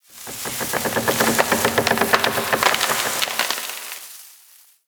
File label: Exterior Tree Wood Creaks Angry; Ambience; Props; Warframe; Duviri